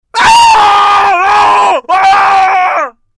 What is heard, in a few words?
scream; teamcity